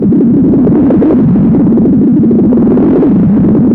WIND RATTLER; Zero-G Ambient 1; WINDRATTLERS

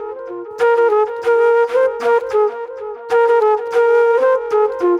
NY 70's Delay Flute; Sonic Foundry (Sony Creative Software); Manhattan Flutes; Session 01 - Benedetta

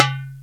ETHNO DR, PERCUSSION; Crypton - DrumBase5000

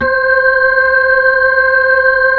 B-3, Brite; Brite Xfade

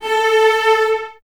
Cellos A 4, String Ensembles; Miroslav Vitous; LINKS ORCH

